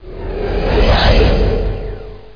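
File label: teleport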